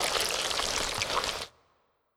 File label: Blob moove